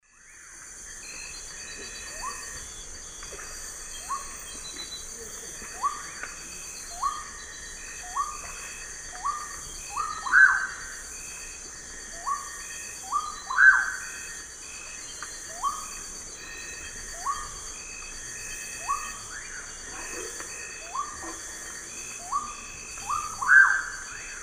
Nyctidromus albicollis, calls and song; S. America; Eastern Lowlands

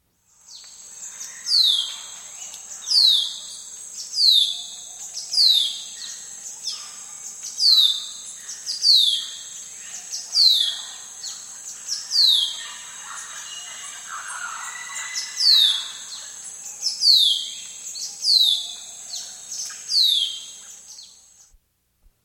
White-throated Shrike-Tanager, S. America

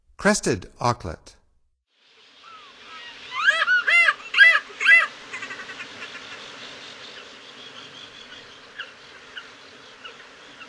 Crested Auklet; North America; Stokes

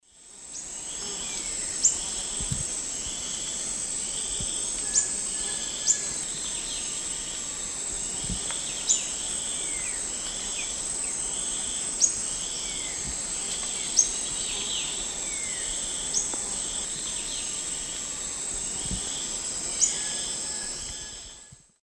Terenura humeralis, calls; S. America; Eastern Lowlands

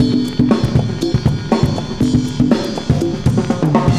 cooling 120, loops, 120bpm

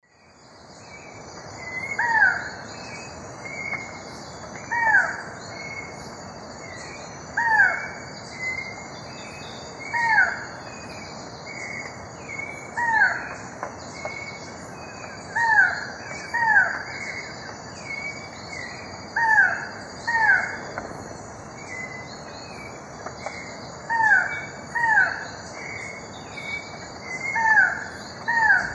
Micrastur gilvicollis, song; S. America; Eastern Lowlands

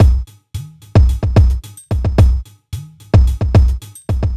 r n b kit 110

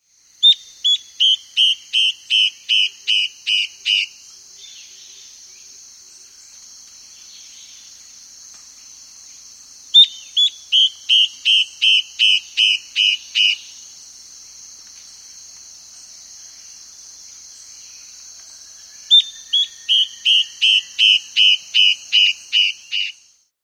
Yellow-Browed Antbird; S. America

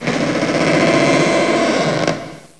SQUEAK

